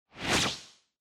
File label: Motion Agility